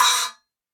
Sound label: SPLASH 08 choke, cymbal; Ableton; Factory Packs; Drum Booth